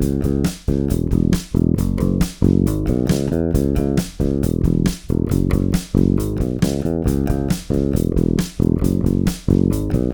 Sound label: rock